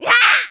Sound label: Shock; Link getting shocked (3rd sound)